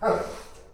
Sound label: dog ok; Apotheorasis